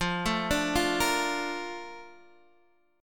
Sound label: A sharp Major 7th F